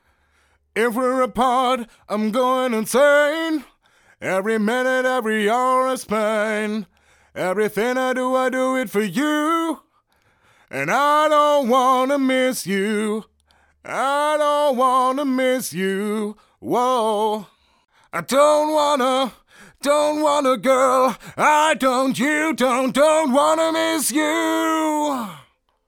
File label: vocals long